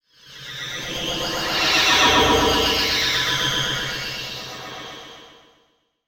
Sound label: Transition Tremolo; Production Element; Title Transition; AdobeCC